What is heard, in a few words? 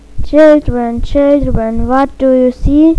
children; bielefeld brown bear